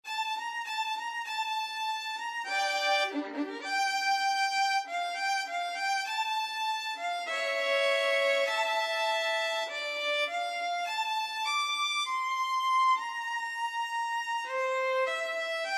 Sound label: Lonely Bar Piano